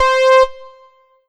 Yamaha TG77 Saw Mono Lead C5

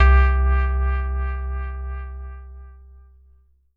Ensoniq ESQ-1 Synth Echo Bell C2

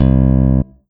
Yamaha MU90R Session Bass C2

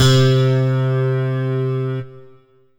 Yamaha EX5 Digiclav C3

